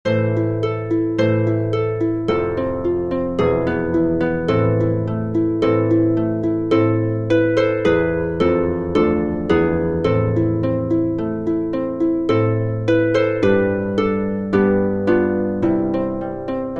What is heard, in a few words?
sans vocal line